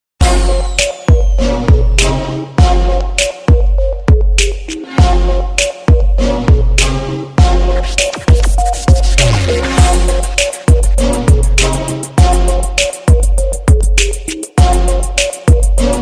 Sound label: new beats; rock